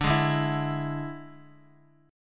D Suspended 2nd Flat 5th D Guitar; strummed; 4F,4A,5C,5G,5C,6E; x,x,2,1,2,0

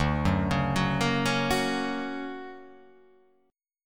D Augmented Major 7th down, guitar

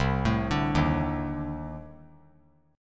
C Minor 6th Add 9th C; Cm6add9 arpeggiated; JGuitar

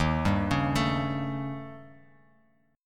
Eb 7th Flat 5th, Bass Standard; 11,10,11,14; Eb7b5 arpeggiated; JGuitar

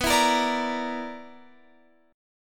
B-Minor Major 7th Double Flat 5th

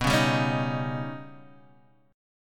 D sharp Augmented 7th B, guitar

